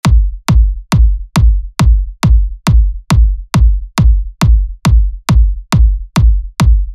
Loops Kick Trance

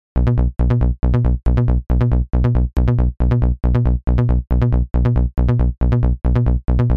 Bass Trance; Vital Trance

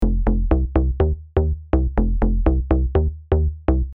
Bass Serum Deep House; deep house bundle

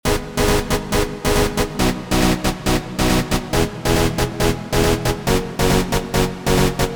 Lead Trance Vital Trance

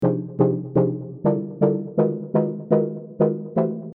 Chords Diva Deep House

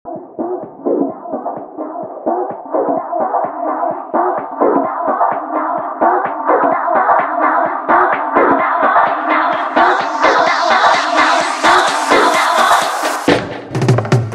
tech house

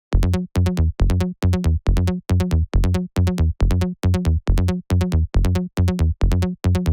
Loops Bass Trance

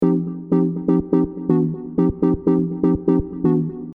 Chords Midi Deep House; deep house bundle

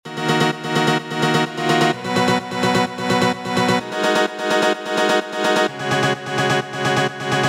Piano Keys

